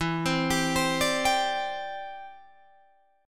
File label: E Minor 7th Sharp 5th, down, Guitar, Drop D